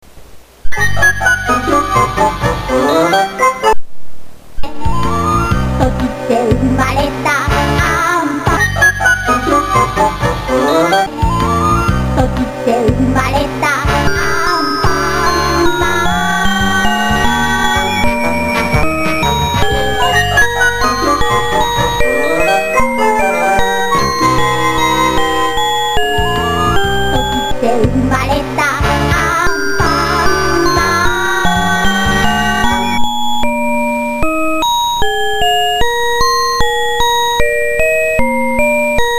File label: orgel